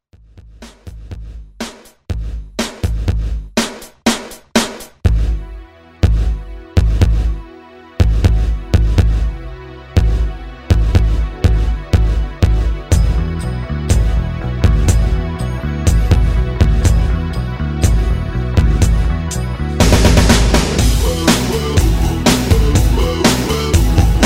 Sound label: 80s rock; Quick Redrum